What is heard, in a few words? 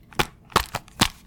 Object catch hold